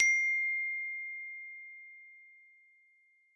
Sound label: iphone note sms